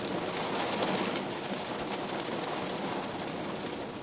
rain on windows, weather; CosmicRage

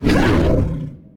attack, she; combat; creatures; ryuchi; CosmicRage

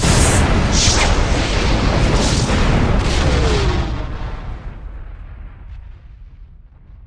warp, ships movement

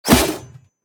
metal, combat, weapons, swingable; default swingable

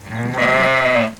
sheep, farming

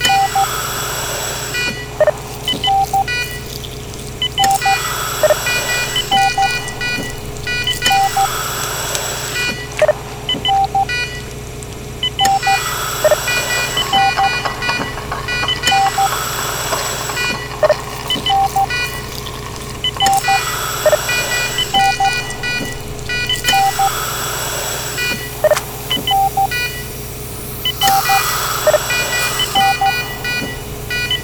operating room, ambiances; CosmicRage

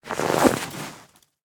snow gather; CosmicRage

